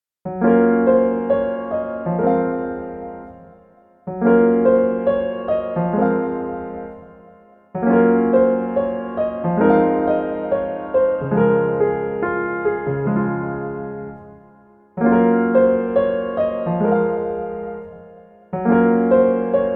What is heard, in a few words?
piano